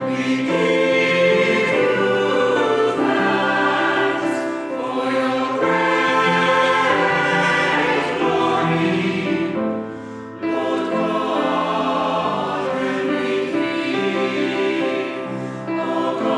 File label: Live Performance Choir